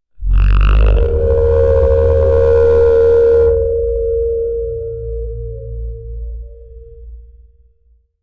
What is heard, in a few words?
ich ich ich iaaagh brrr, vocalization